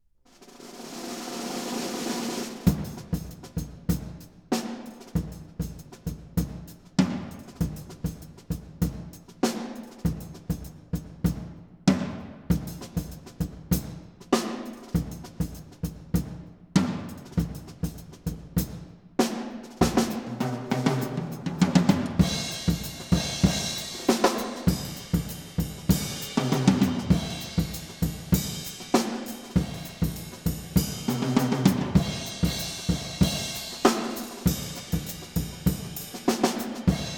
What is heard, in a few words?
Percussion Imaging Tests, Drum 15 Feet, Live Studio: Test